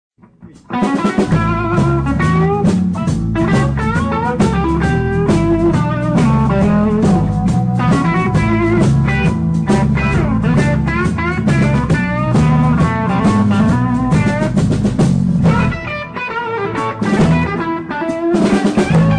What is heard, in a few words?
stumble solo